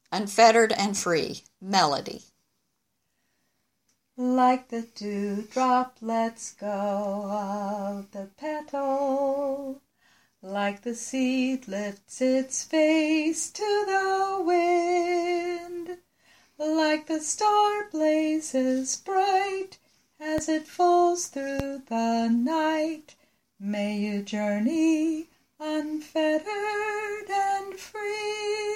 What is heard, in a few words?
Melody